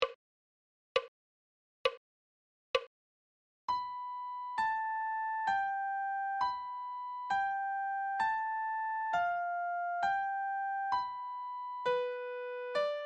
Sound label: treble clef adagio